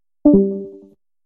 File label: Leave, connection leave sound effect; Discord